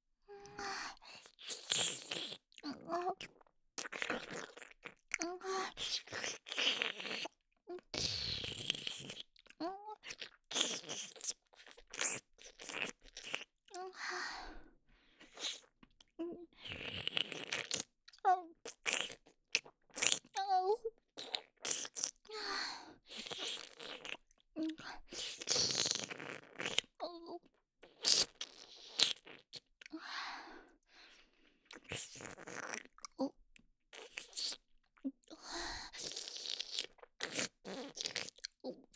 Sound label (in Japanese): フェラ音(激しめ)